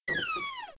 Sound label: Office Door Squeak; Playable Tutorial Level